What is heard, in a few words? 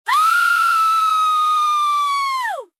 player fall scream